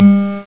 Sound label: harpsichord, string instruments; harpsichord 1